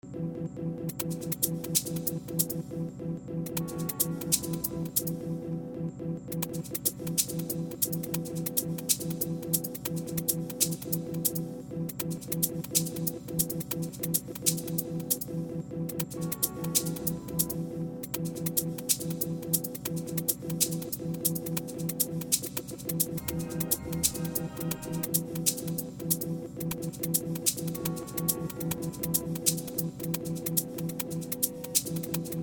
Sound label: instrumental; 2006 glitch instrumentals